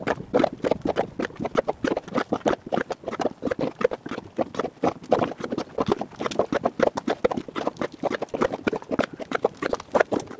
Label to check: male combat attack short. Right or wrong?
Wrong